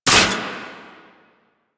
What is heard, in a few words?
Rocket Launcher Dry Fire; Weapons; DE; Warframe; Tenno; Lasrian